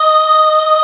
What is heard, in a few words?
AAH D#4, vocal, pop chorus; VOCAL ORGAN